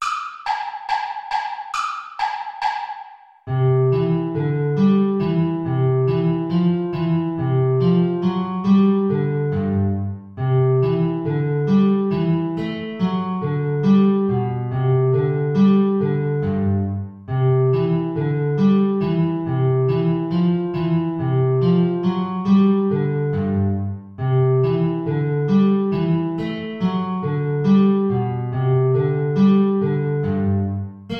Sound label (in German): Hornpipe, Altflöte & Gitarre, tiefe Notierung, Play-along